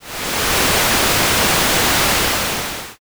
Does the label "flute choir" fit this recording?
No